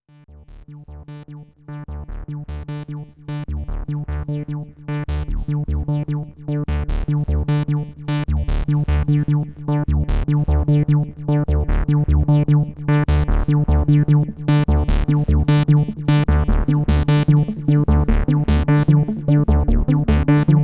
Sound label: ANDROMEDA A6 Multimode